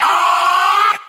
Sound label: "ah", male; Voice; ooh ahh